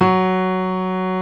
BOSENDOR F#3; East West - Global Sounds

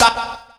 vox bap; E Lab; XTc Files of House

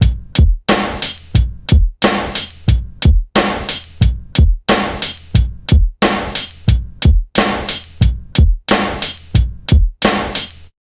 90 BEAT, Pure Electro; USB Soundscan vol.03; AKAI

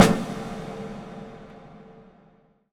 Snare Hit, Impact Drum Hits

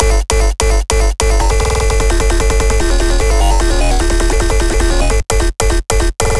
Cyber Bass Loops

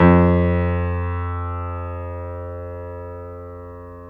PNO Grand Pno; Club-50 - Foundations Roland

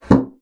bassoon slap; Xsample VOL-6; C BASSOON

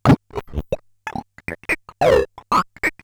Bent Talking Teacher, Circuit Bent

